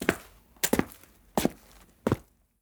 foot steps in boots

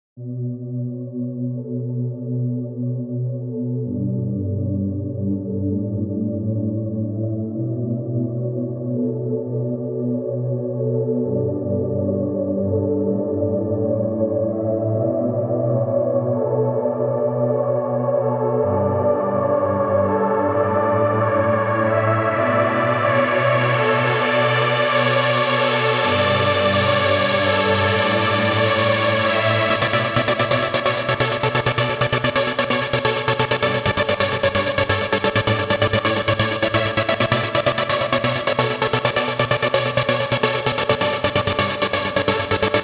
gated trance pad

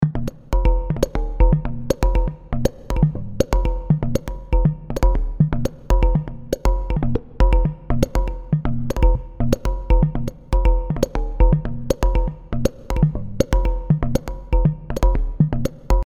drumpad claydrum; stix

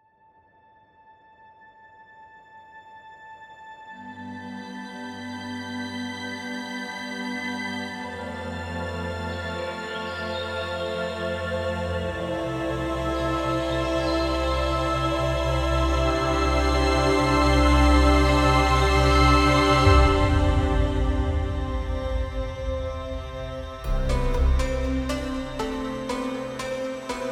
green planet ambient

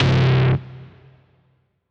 slam

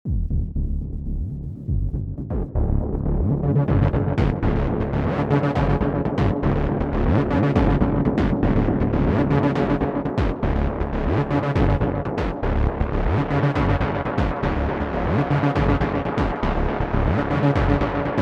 filtered echoes

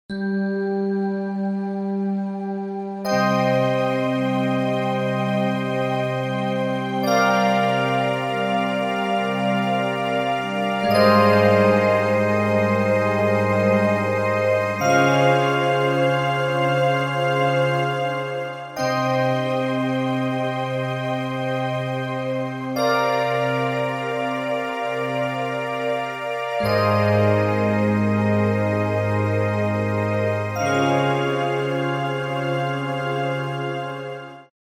new age organ

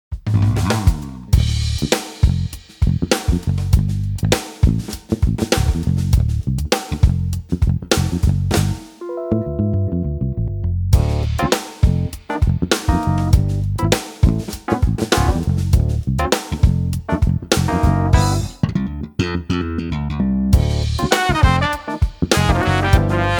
modern bass, night club

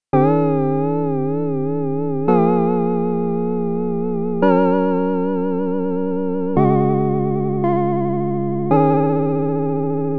vibrato

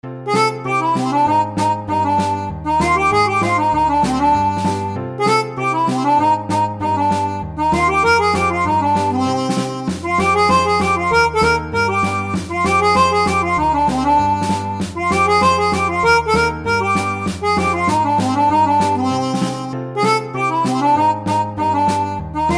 harmonica vibrato

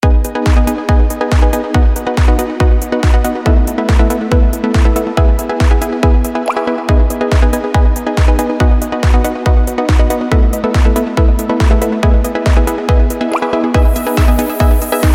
melo pluck

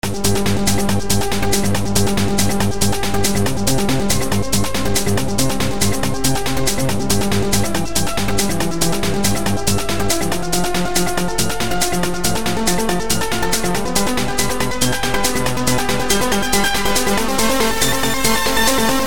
uplifting trance

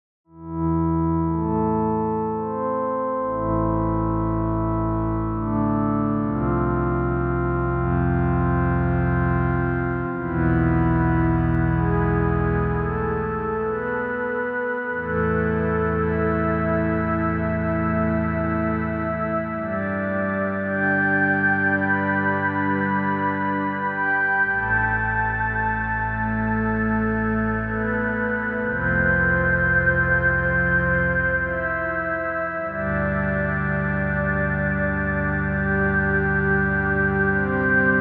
saw landscapes pad

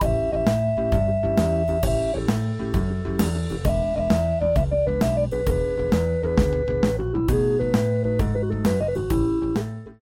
soft organ

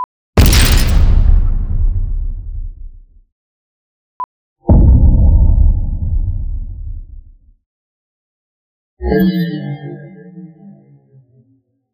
explosion

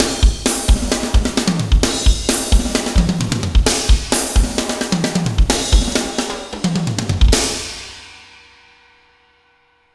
bar end 131 bpm

